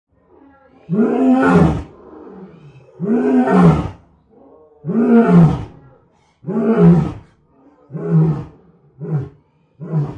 Lion Roar Massive Mul; big cats

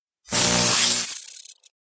Electric Arc Short Circuit Electric Shock; arcs & sparks

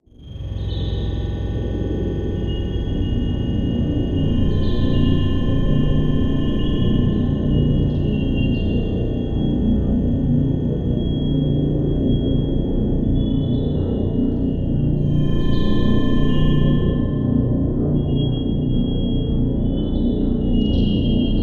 Sheet Metal Bend Thunde, deep

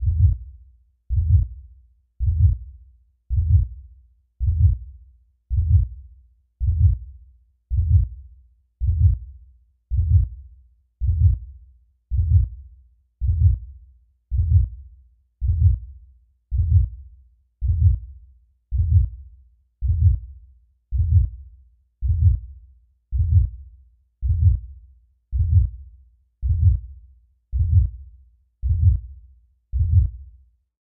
Heartbeat

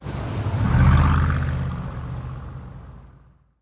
driveby muffler; car & truck bys

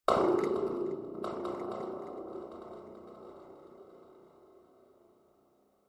Spring Drum Sml

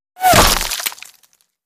Bullet Impacts Wood I

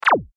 Laser Gun; lasers

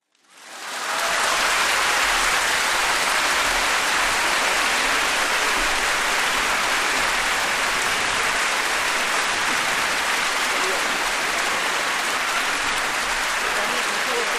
Audience Applause, crowds int

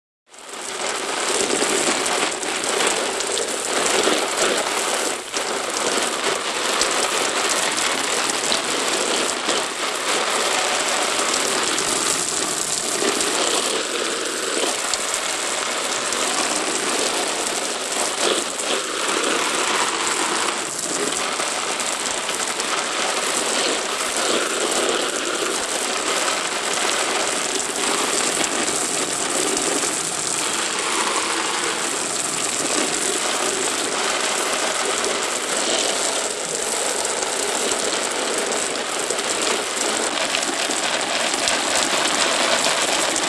Hose; Hose Spraying Objects. Mobile.

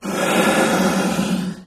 Lion Hiss Single Deep; big cats

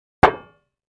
Impact Metal Sheet; flexing metal

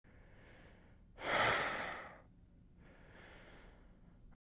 Single Long Male Sigh; breathing

